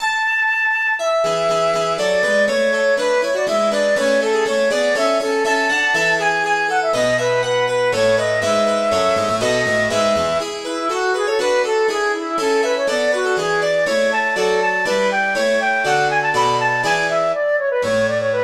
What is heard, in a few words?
Allegro II MIDI; HWV 361